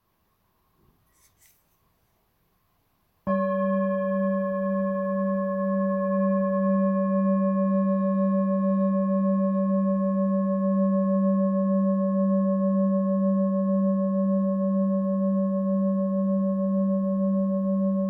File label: Gong